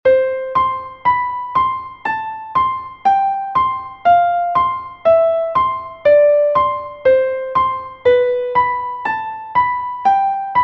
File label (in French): PASSACAILLE